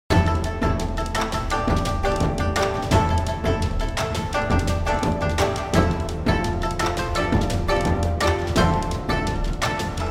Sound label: bg battle; bg battle 2